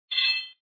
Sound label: Sword Hit